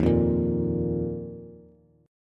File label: Eb Suspended 2nd, Bass; Ebsus2 strummed; JGuitar